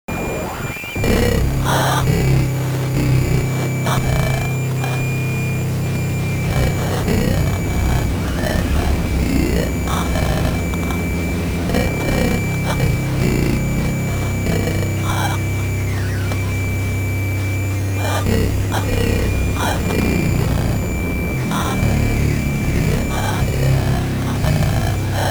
noise; cake covers

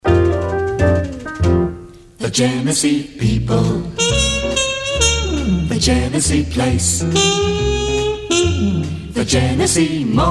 Jingle; Lorin Frank Productions; Genesee

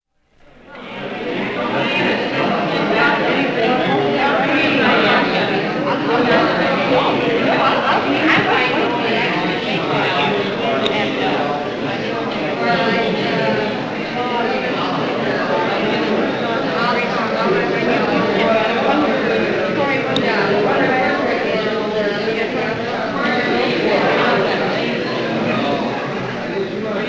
Crowd Large

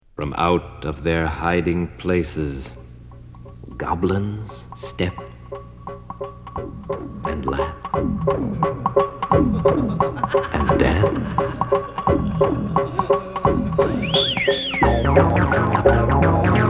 Ghostly Sounds

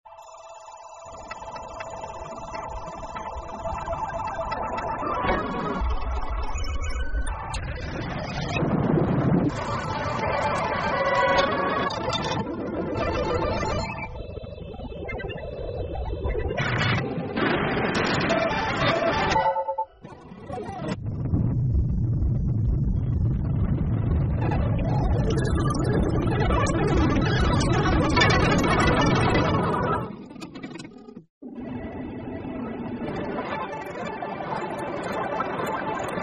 Tubular Bells; Adnoisium

